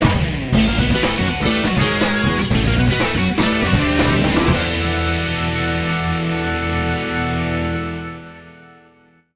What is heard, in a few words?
league start jingle; GT7SP; GTS